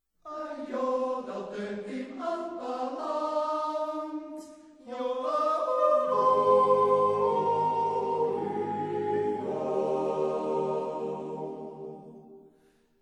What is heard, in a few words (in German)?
Jodler